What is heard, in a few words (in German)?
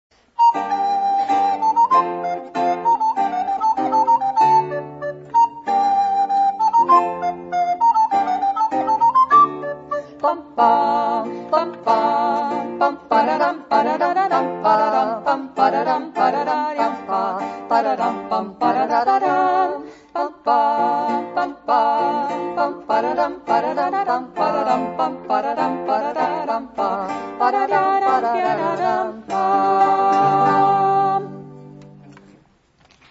musik